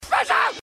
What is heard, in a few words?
spaceship